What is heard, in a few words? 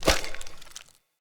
Foley Melee Swing; Enemy; Characters; Corrupted Sproutfolk